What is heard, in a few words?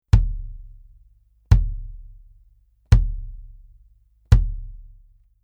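BASS Bury Beater, bass drum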